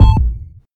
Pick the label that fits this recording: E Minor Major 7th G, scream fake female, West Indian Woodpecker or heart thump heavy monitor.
heart thump heavy monitor